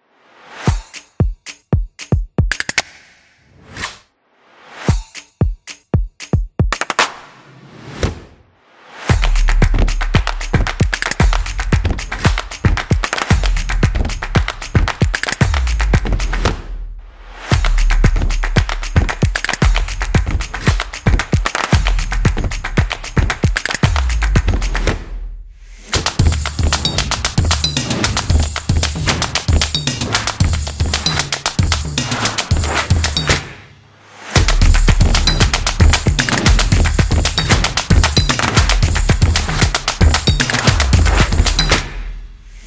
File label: Upbeat Drumming